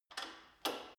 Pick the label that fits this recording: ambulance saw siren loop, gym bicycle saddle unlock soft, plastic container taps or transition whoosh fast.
gym bicycle saddle unlock soft